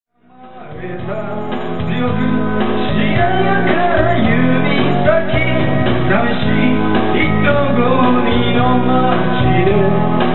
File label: song